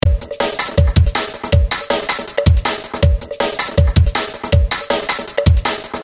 Similar Loop, This is a drum loop that comes standard in FL Studio; sped-up break beats